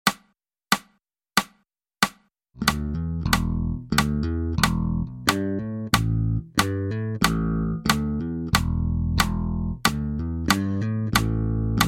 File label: Funk Bass